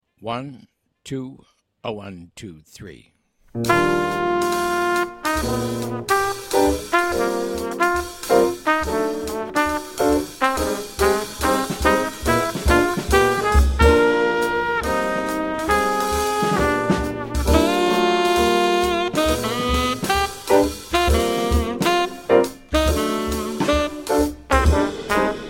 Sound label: minus bass